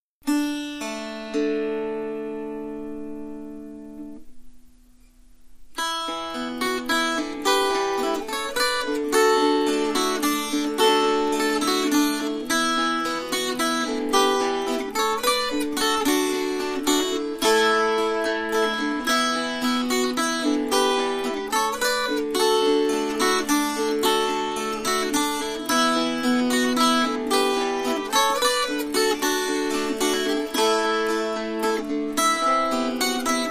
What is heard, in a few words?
arran boat song with harmony